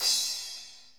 splish, cymbals

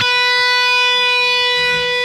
stroke, Guitar; Notes

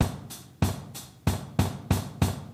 all kicking, Drum loops, Rolls

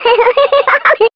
laugh girl; ooh ahh

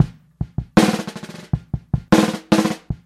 soft rolls, Funky Drum loops

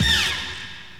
squeak, percussion; Misc Percussion

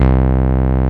bittern, Synths; Notes

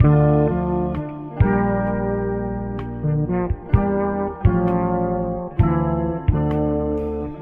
keys fake trombone, Sad; Loops

